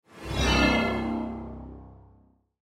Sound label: Sci Fi Spaceship; Spaceship Small Hovering Whoosh; Spacecraft